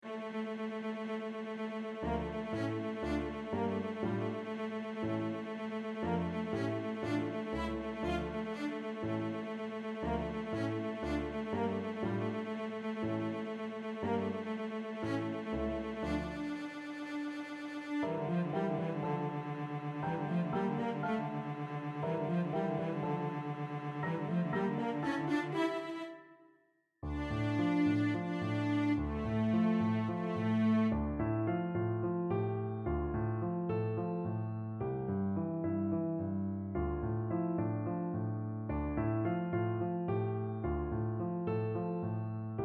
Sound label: Cello Sonata; Tirana